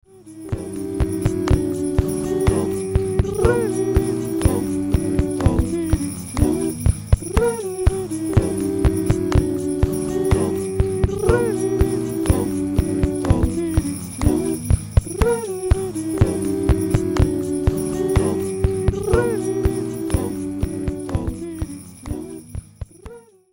boucle

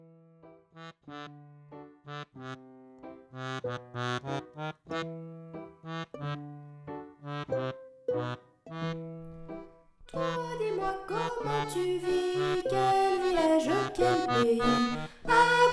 voyage avec chant

